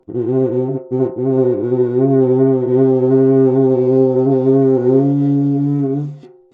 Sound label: Cuica; Percussion Samples